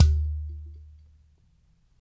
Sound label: UDU, African